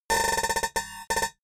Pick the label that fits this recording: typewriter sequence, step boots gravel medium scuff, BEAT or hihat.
typewriter sequence